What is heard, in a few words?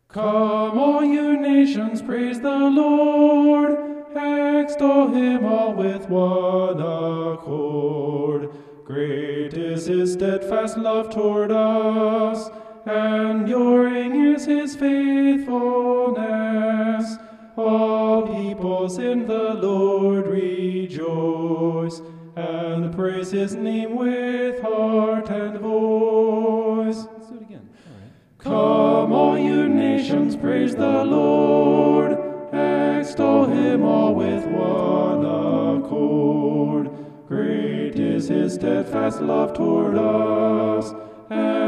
vocal homoph; psalm 117